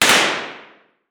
Weapon Gun Machine Gun 9mm Multiple Shots Interior Shooting Range; AdobeCC